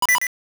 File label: Low Health; Oracle